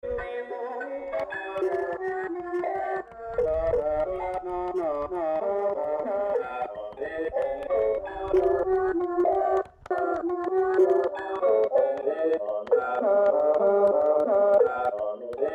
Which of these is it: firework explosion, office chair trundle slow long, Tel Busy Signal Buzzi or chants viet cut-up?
chants viet cut-up